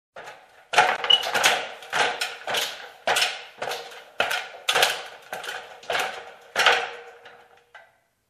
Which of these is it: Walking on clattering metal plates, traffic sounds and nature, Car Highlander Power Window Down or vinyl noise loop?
Walking on clattering metal plates